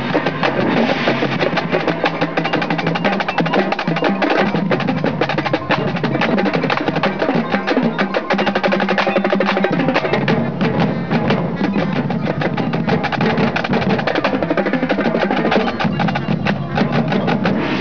rumba break, drums

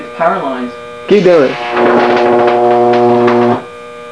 pig arc

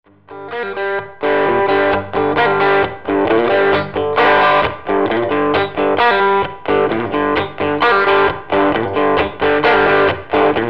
riff

